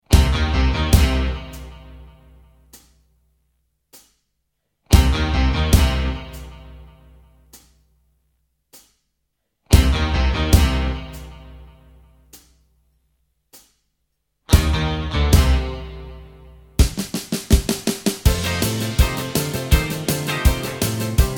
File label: (C) No Backing Vocals